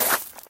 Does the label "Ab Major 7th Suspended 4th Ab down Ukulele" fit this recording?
No